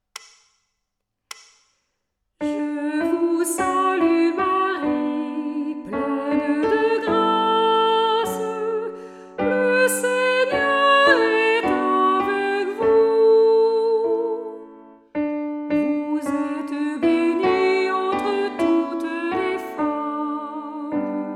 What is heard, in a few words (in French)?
Je vous salue Marie soprano